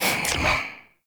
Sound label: Rifle Alt Mech; Weapons; Warframe; Zariman